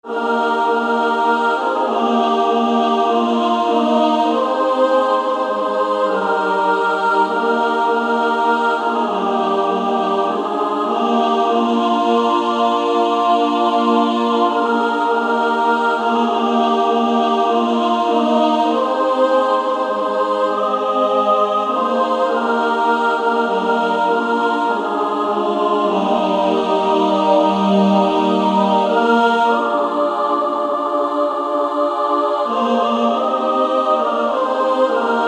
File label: Female Choir SSAA; He Hideth My Soul